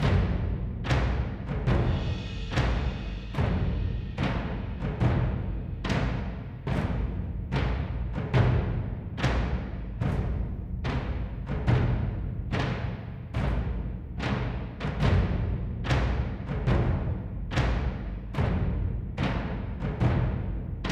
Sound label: Combat Big Drums Cymbal Stem; Necropolis